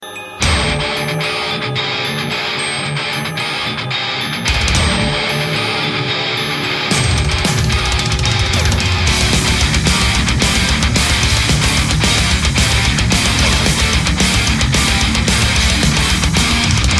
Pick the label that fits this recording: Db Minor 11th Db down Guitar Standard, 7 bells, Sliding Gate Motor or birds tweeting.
7 bells